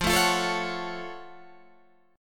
Gb Minor Major 7th F

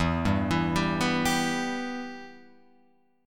E-Minor, Guitar, Open F; arpeggiated; JGuitar

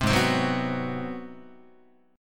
G sharp 7th Sharp 9th, Guitar; G#7#9; strummed; 3,4,3,4,3,5; JGuitar

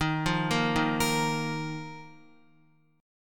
Eb Suspended 2nd, Guitar; Ebsus2 arpeggiated; JGuitar

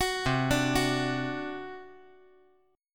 C Suspended 2nd Flat 5th C down

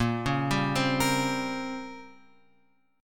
A sharp Minor 6th Add 9th; A#m6add9 Chord {6 4 5 5 X 6} arpeggiated

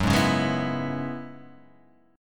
F sharp 7th Suspended 2nd Suspended 4th, down

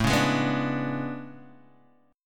A Major 11th, down Guitar Open D

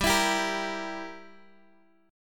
G Minor 6th, Guitar Open G

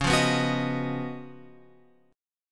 C sharp 11th C sharp; C#11 Chord {9 8 9 11 X 11} strummed

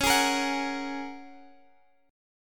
Bb Minor 7th Sharp 5th

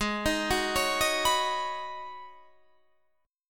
B 6th Add 9th Ab, chord

